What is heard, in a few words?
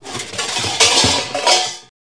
pots pans; KABOOM! - Wild and Wacky Sound Software; cartoon accents